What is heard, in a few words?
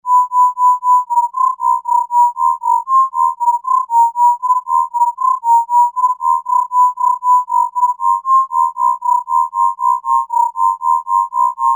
throb 4